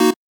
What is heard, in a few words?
Chord